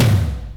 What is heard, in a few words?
FUNK TOM, FUNK KITS; Crypton - DrumBase5000